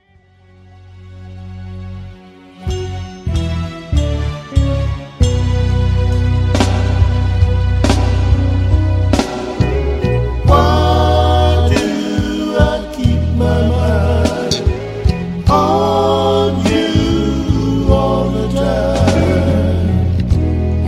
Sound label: Natural High, Latin Express